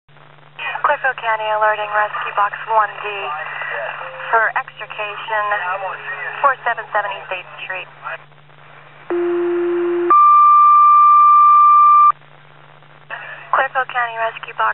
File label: rescue box gas tank; clearfield